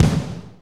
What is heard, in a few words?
KIK AMB, kicks; AMG; Now CD-ROM (Roland); NOW! Drums